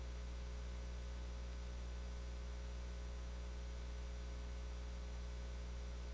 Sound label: howl